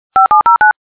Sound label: sound, newmail notifier